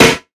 prominent steel snare drum A key, steel snare drum tuned to the A note